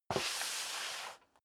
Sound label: step sneaker metal dry slide long; schritte